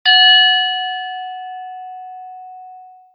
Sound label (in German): Gong